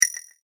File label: multimedia rollover, Multimedia Rollover Tone; Tones; Sound Design; McKinney Sound